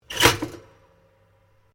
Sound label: Toaster Old Popup; Various kitchen appliances; Household